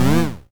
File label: Retro Game Weapons Sound Effects